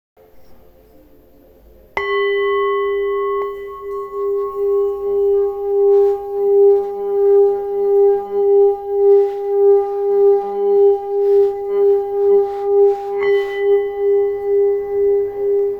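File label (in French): bol, Bol tibétain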